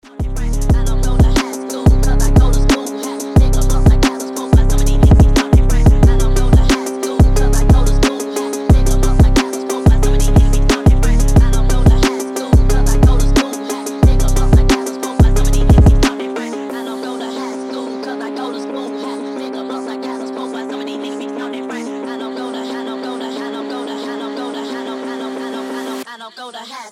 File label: Dombyra Ringtone